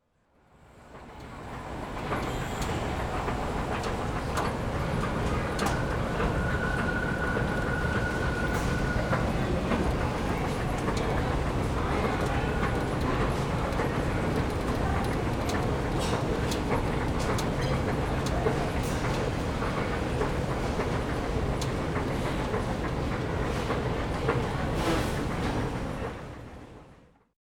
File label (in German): Rolltreppe